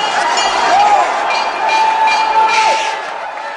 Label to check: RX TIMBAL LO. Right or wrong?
Wrong